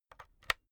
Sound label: insert usb b hard; objekte; elektronik